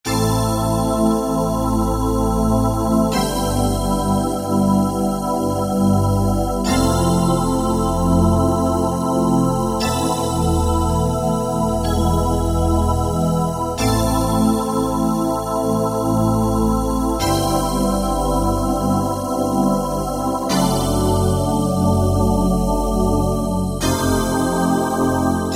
Staccato Heaven, Roland D-50; Factory preset demos

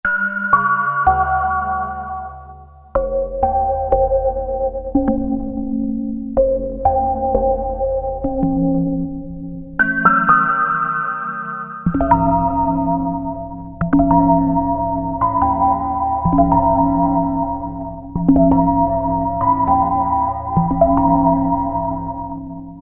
Cave Echo, Korg PolySix; Factory Presets Bank B

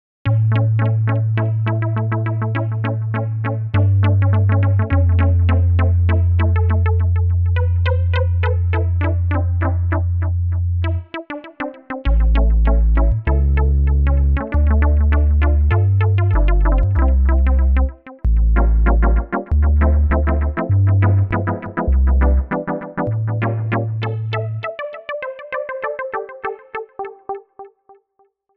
Touch Echoes, synth

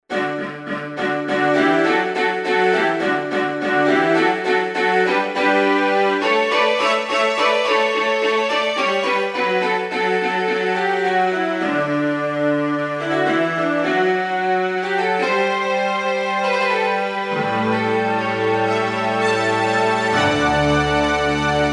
Strings, Korg M1; Factory preset examples

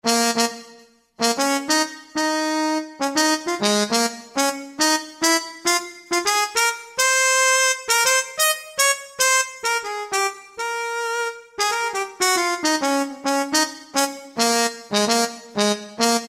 Jazz Mute, Alesis QS8; Factory Preset examples

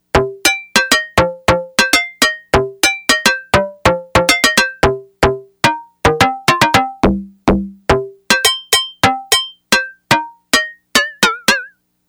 Heifer Bell, Yamaha DX100; Factory Patches

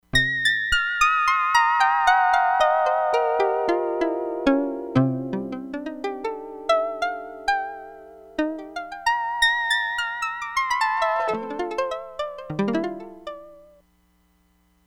KOTO, Crumar Bit 99; Factory Programs

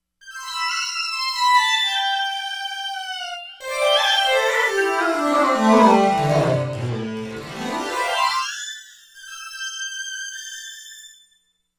ethno lead, Korg; Korg MSC-13S Ethnic 2

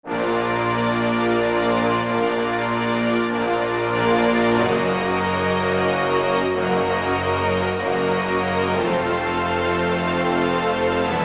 Horn Strings, Roland SR-JV80-02 Orchestral

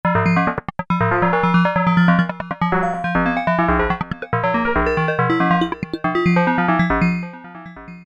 X-Mod Perc, Korg MS2000; Factory Patches

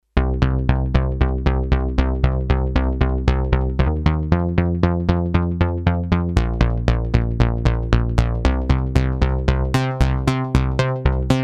DCO Bass

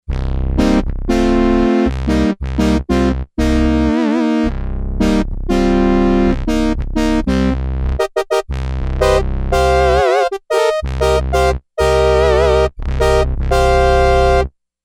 Sy. Brass

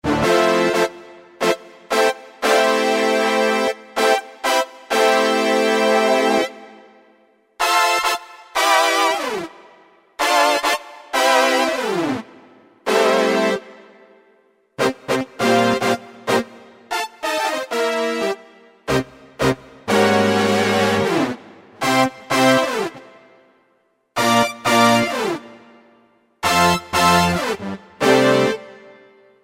Neo Stabs

